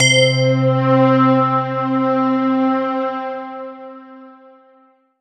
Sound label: Yamaha TG77 Mystery Pad C3